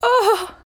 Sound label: Emote Female Scared; gamerip; Idle Champions of the Forgotten Realms